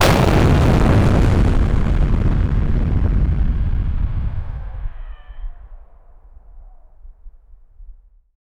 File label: a explosion near a skyscraper